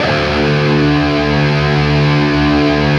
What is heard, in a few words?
LEAD D, Guitar; East West; Steve Stevens Guitar Samples Collection